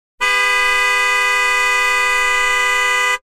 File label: car Horn Long; butterflysoup2